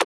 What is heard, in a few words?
Transient; FLPRO